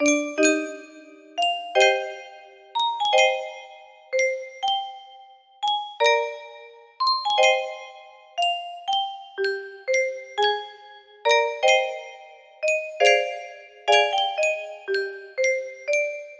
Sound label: acoustic grand piano